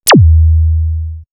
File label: Zap, synthfx; X-Static Goldmine